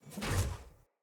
Sphere Polearm Whoosh Small; Weapons; Player

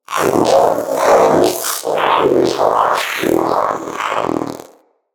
Companion Vocal Idle Growl, Pets; Zanuka

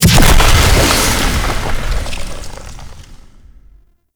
Void Dragon Firebreath Cast Ice; Enemies; Abilities; Warframe; Duviri

